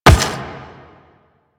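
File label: run on rock, footsteps; Warframe; The New War; Kahl; Quests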